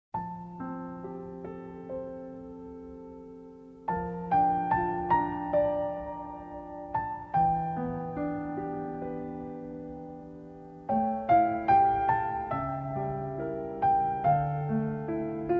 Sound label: Piano Version